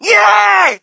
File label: crowd, shout; Mike Tyson Heavyweight Boxing